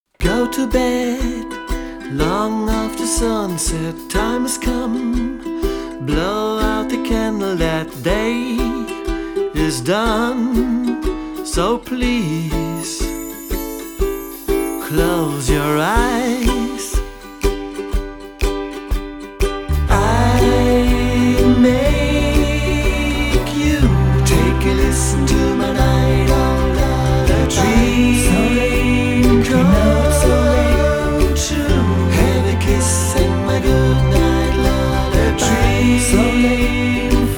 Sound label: Lullaby